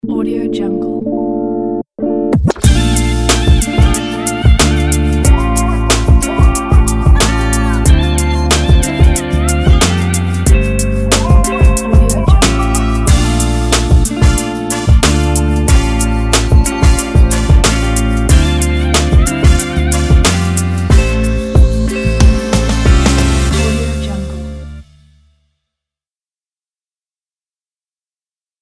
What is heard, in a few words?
hiphop ident